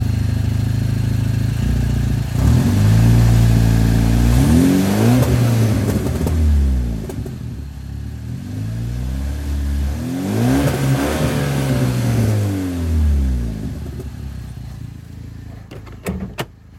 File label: new intercooler new problems turbo leak